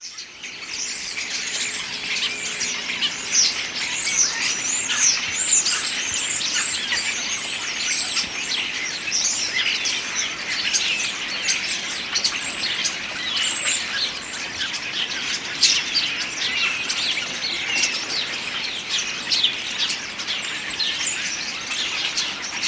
spreeuw herfstzang

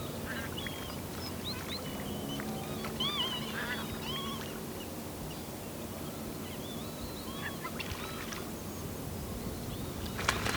duikende en wegvliegende aalscholvers

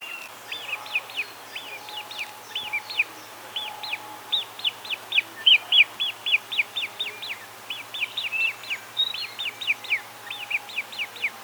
fuut juveniel bedelend

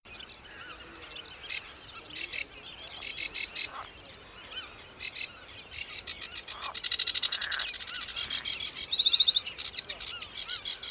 rietzanger zang

